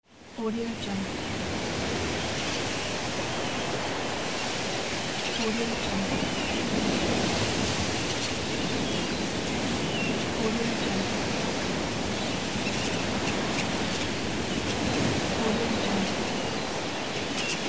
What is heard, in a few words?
sea ambience